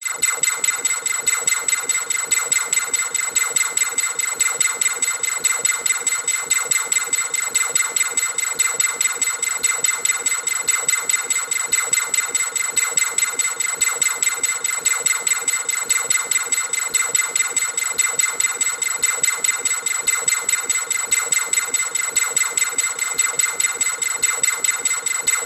science fiction machine beeps clicks operate electronic